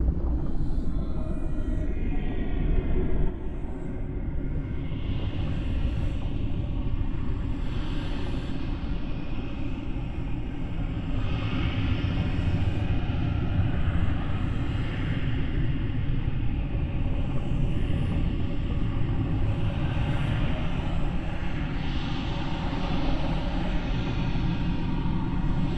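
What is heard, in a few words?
Ambient Bloodtank Loop; silent hill homecoming 2008 gamerip pc ps3 x360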